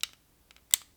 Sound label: Electronics Flashlight Plastic Button